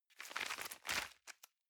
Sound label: envelope swap multiple